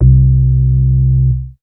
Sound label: C3, BASS